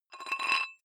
dumbbell thread take off weight soft, fitnessstudio